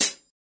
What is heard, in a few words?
Hat Closed